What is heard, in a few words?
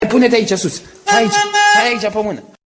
HONK HONK HONK